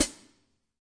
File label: real HiHat; REAL LIVE KITS; A Real Freakin Drum Kit